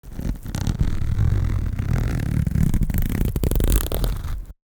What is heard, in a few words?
Zipper